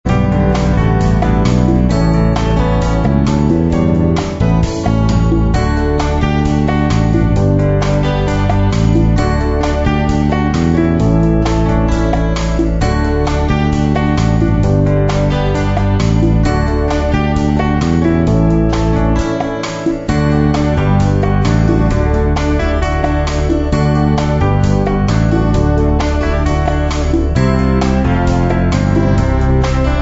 Light Hearted

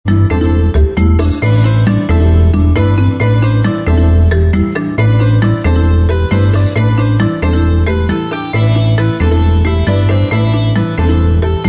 Ethnic Pattern

